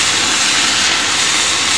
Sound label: flare; ambience; caged